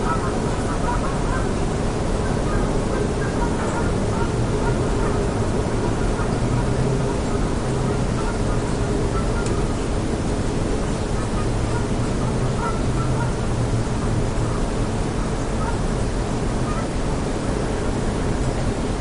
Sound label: lockdown holiday Sunday 3am ambient omnidirectional max gain, garden ambient; normalised